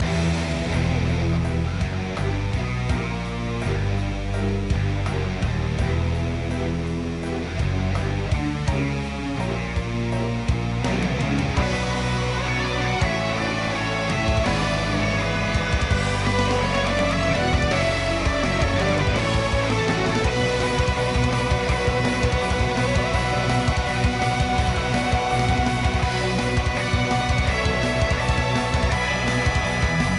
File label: battle metal